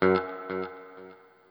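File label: NOODLE SHORT, Guitar & Bass; Quantum Leap; 90's Sample CDs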